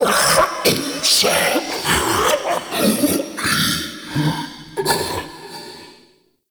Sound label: Mockery Male Vocal Stand Long, Male; Enemy